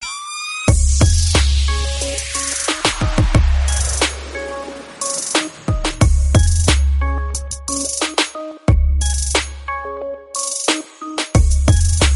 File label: Ringtone